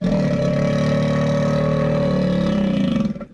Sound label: growl; toilet